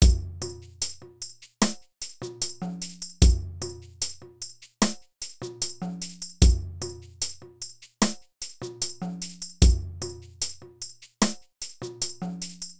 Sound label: drum75; cubase